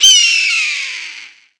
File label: eagle; ghost